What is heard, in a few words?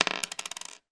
clothing button drop on table; foley